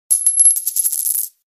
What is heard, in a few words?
New Coin Sms